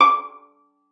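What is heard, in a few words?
violin pizzicato D6; Xsample VOL-5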